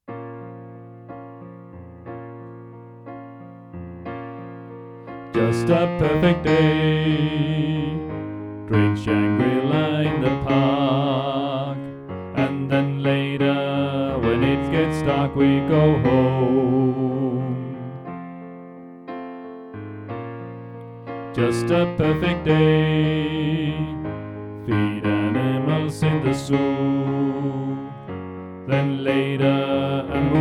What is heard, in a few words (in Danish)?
Bas; oevefiler